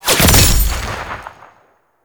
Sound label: Kill Notifier; Warframe; NineteenNinetyNine; Quincy